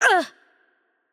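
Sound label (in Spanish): Hurt Light, Spanish; es ES; VO; bloom minigame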